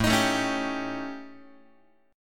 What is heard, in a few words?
A 7th Flat 5th Guitar; A7b5 strummed; JGuitar